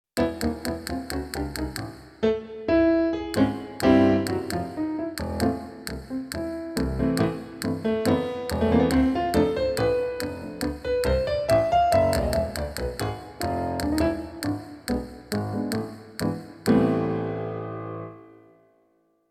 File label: Grand Piano